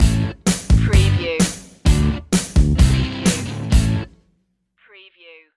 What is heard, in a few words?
stinger